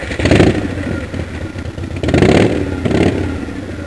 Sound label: harley